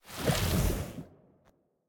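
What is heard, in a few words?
movement armor; smite; cthulhu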